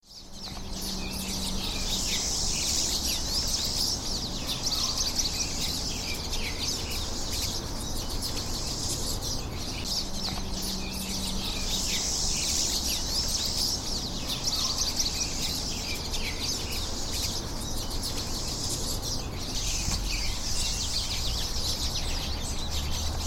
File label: birds long